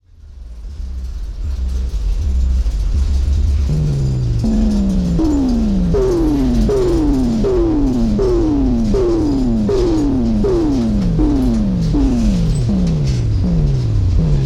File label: Duet for Synthesizer and Rail Cars